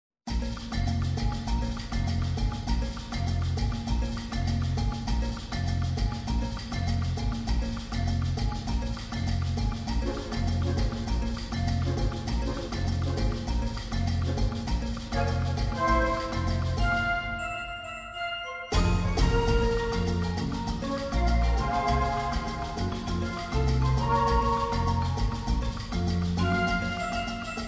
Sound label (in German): Salsa